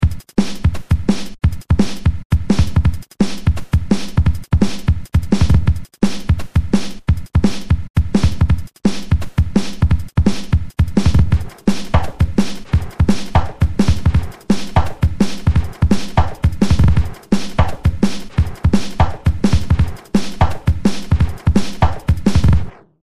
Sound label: Drum kit, Synthetic Drums; NI